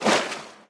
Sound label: swim; smite; poseidon